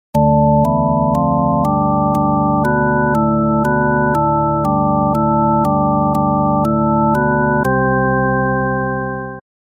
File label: vibrato percussive organ